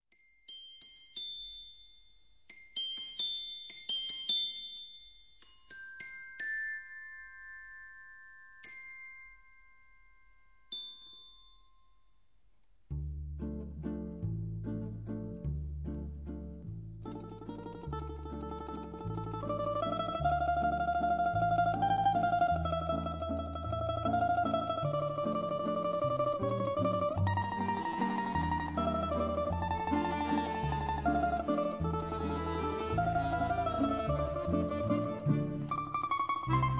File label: waltz